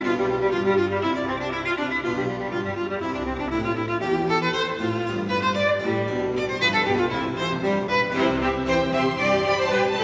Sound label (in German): konzert fuer viola und streichorchester g dur allegro